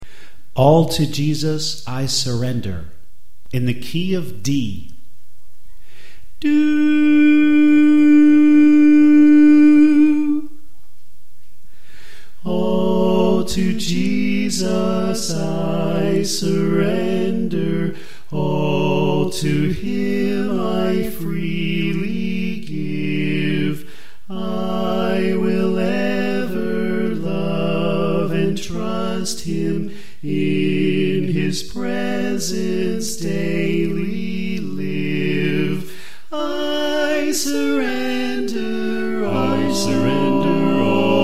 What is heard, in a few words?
Bass Left; All To Jesus I Surrender